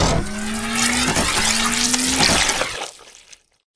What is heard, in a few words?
enter, combat suit